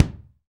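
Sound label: bass drum, Roland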